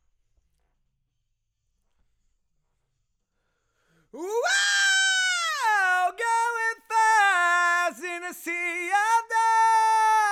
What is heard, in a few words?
Ad Lib